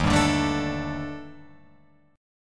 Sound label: Eb Major 7th Flat 5th, Guitar Open F; strummed; chord